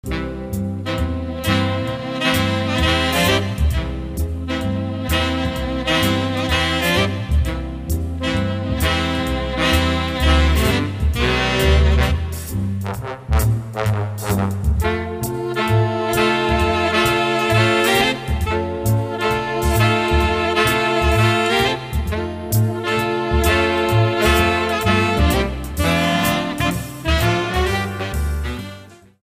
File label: string